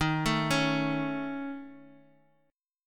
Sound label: Db Suspended 2nd Sharp 5th Eb